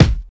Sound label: short kick single shot F key, Royality free steel kick drum sound tuned to the F note; kick drum samples